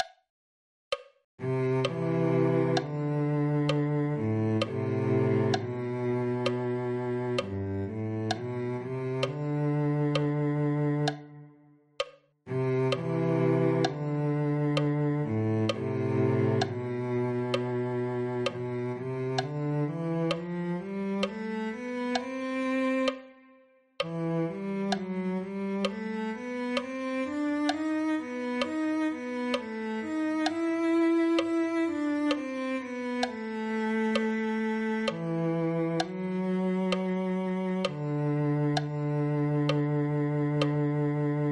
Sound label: cello